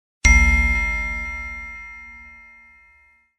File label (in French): note inquietante